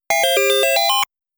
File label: Extend Sound; Xevious